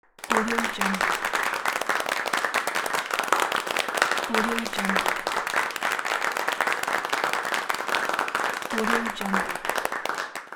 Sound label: applause